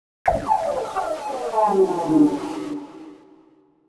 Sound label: gun